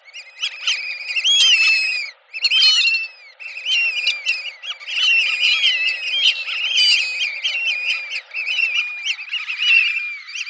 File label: Dendrocygna arborea loud whistle